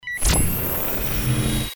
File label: click run; jinteki